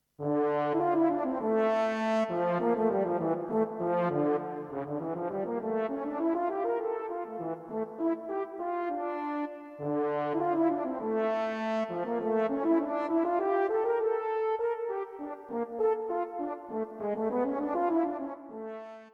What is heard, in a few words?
Just the Horn, Brass